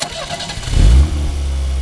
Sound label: car; SoundFactory; FX